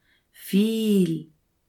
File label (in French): elephant